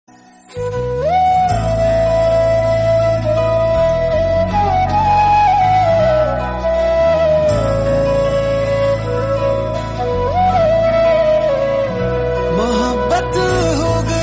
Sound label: Flute Version